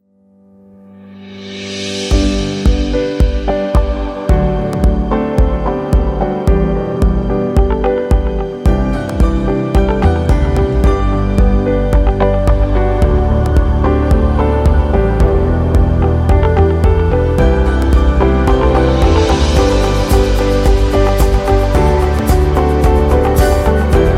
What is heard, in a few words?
Inspirational Ambient Commercial Music